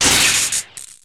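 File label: Electric Crack Sizzle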